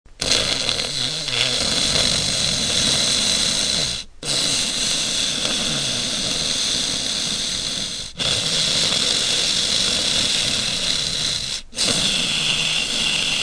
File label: snoring